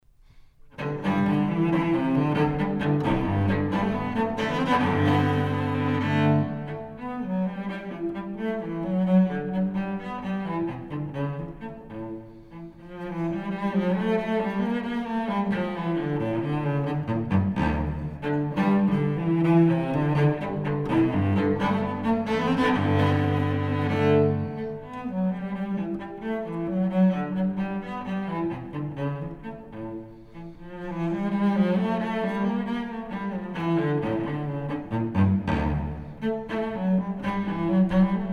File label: Suite No. 1 In G Major - Gigue, Cello; Bach Cello Suites; BWV 1007